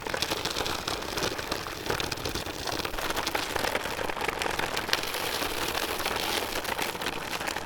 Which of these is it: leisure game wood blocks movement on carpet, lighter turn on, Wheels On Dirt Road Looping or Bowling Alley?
Wheels On Dirt Road Looping